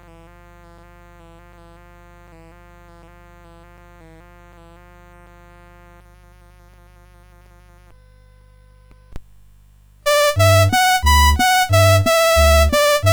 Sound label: CSG8580; CSG8580R5